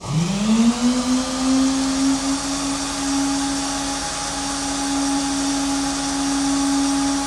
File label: Aircrafts P-51D Actuator Oil; DCS